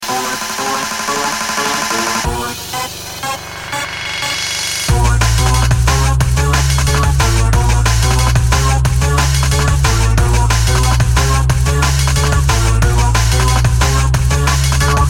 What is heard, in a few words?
Dnb